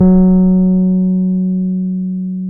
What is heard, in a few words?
F#4, Pianet; Keyboards of The 60's and 70's; H.Pianet